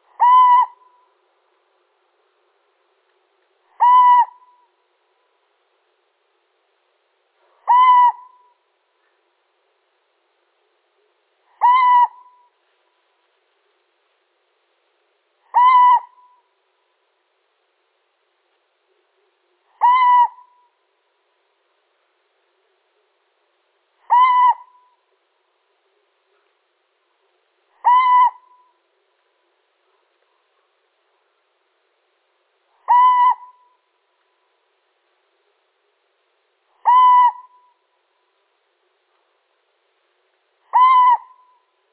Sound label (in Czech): Sycek obecny samec